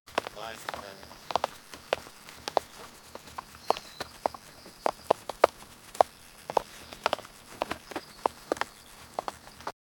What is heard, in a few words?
a man walk at forest; character movement